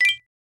decision, button

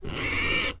boar child cry

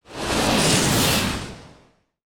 punch slow

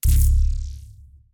menu, button

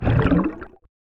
underwater breathing

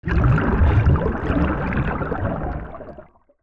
underwater drown

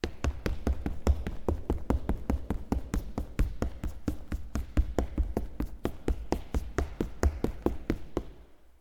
dash gymnasium

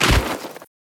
rubbing clothes kick

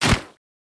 rubbing clothes punch

